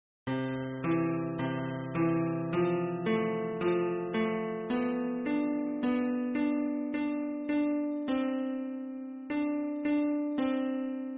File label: two key beautiful music